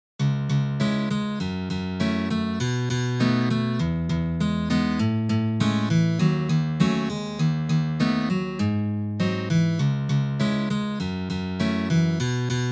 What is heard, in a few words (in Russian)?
Melodiya iz Stalkera na gitare, gitare